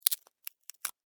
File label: Termite, Bite, Wood, Splinter, Crack, Insect, Bug; Blastwave; Animals:Insects